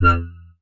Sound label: bass tweak foul F; Polyend; ARC Noise